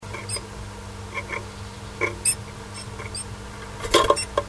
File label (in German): meise leise rufe; nistkasten